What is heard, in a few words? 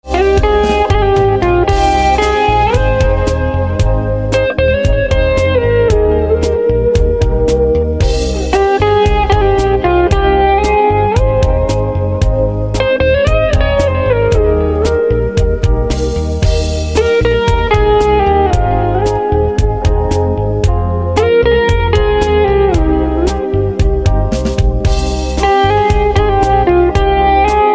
Guitar